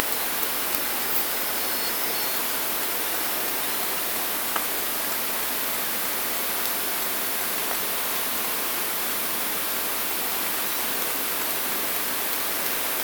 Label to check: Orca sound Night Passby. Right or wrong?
Right